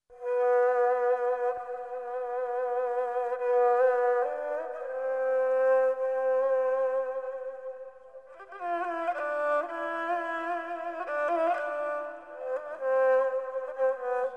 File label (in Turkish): Kemence Taksimi; Mevlut Ve Ilahiler